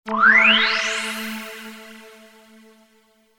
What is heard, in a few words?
Power Up